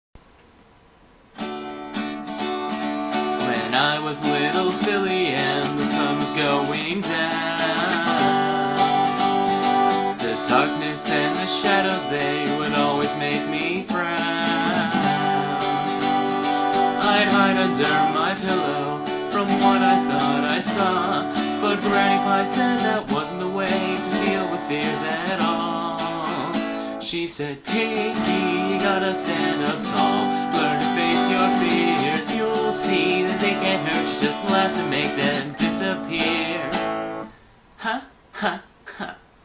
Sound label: (Guitar Cover)